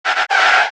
stop; FOXBEAR; DIRECTX

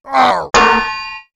miss; FOXBEAR; DIRECTX

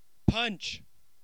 PUNCH; ROCKEM; DIRECTX

